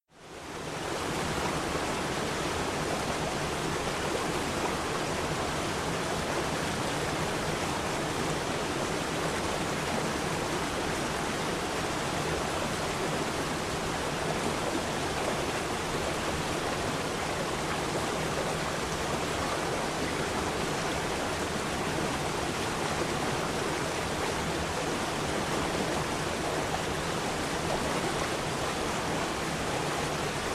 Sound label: Relaxing Nature Sounds Rainforest Waterfall no music